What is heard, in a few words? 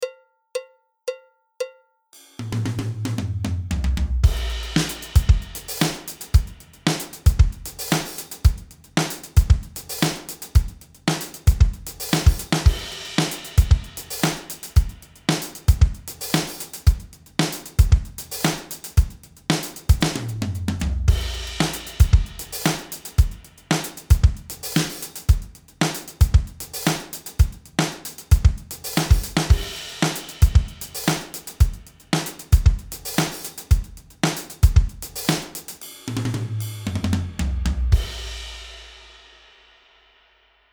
acid jazz style, drums